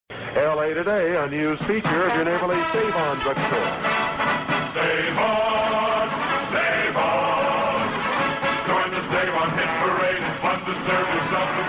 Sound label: jingle; savon